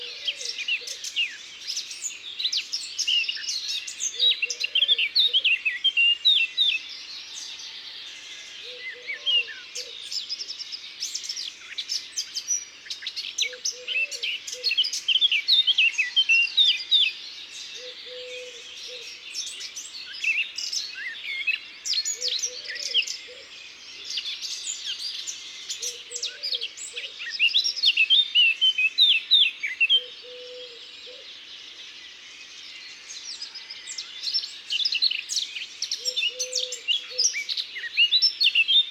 Fauvette a tete noire; Isere; Concerts naturels de l'Isere; Studio Les Trois Becs

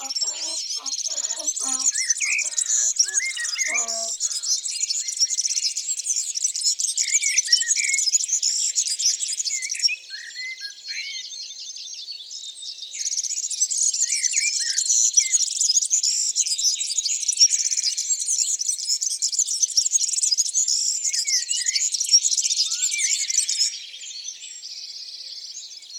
Serin cini; ABC de la nature

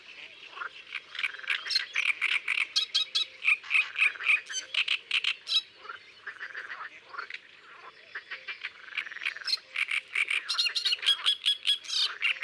Rousserolle turtoide, Concerts naturels de l'Isere; Studio Les Trois Becs

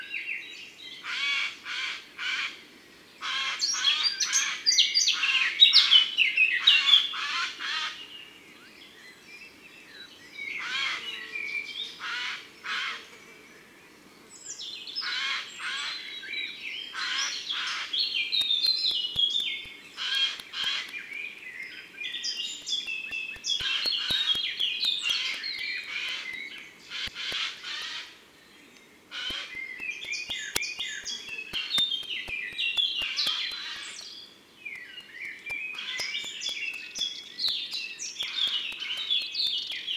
Geai Des Chenes; Ardeche; Concerts Naturels De l'Ardeche

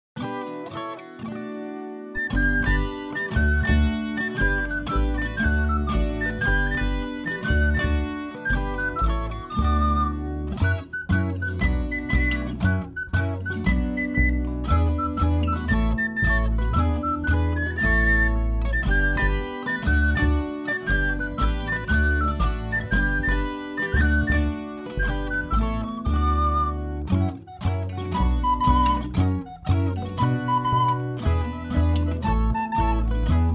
La taupe INSTRU

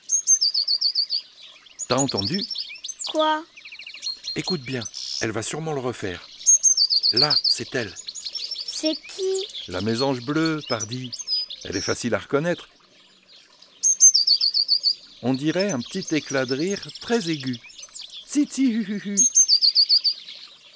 La mesange bleue; cuicui et compagnie

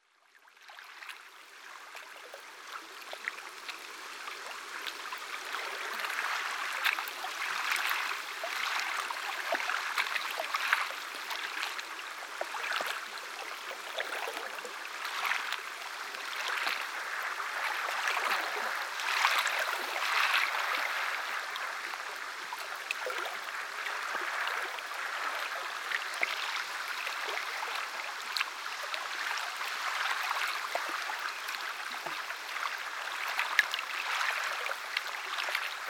Chants de l eau Lac

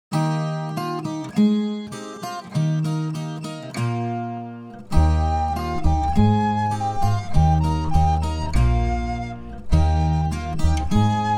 Le ver de terre INSTRU

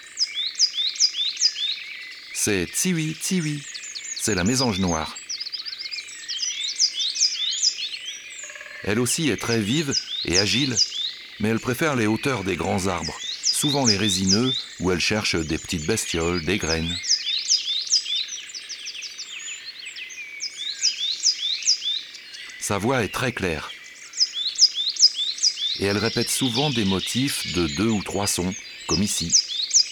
Mesange noire; abc de la nature

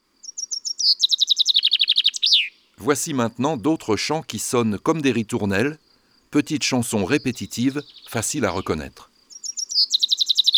Pinson des arbres

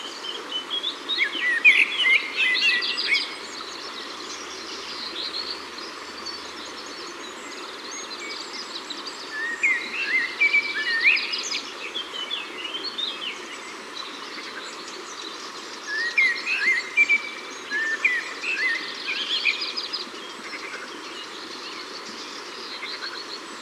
Merle Noir, Ardeche; Concerts Naturels De l'Ardeche

